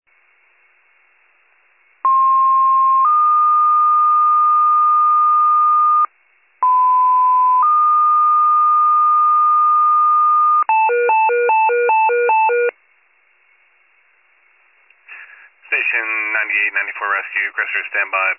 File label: fire, pager alert